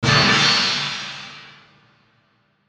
Hit Crash Metallic, Hit Crash Metallic Bright Fast